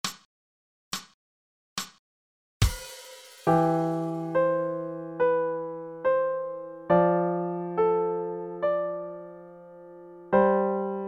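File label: 70, Pianoforte; Aura Lee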